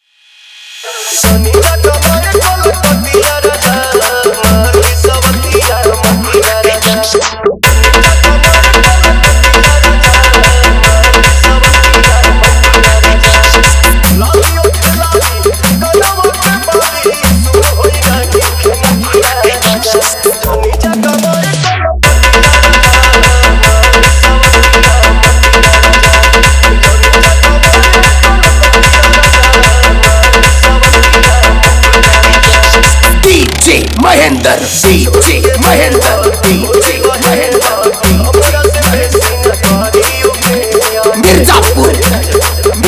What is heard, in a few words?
Bhojpuri Remix Song